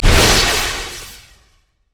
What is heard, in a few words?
electrical surge bolt; LoL; kennen